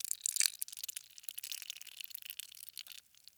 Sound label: Blood Squirt Spill; Haunted Bloodlines; SFX Producer